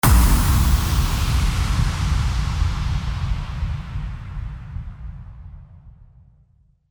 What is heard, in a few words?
IMPACT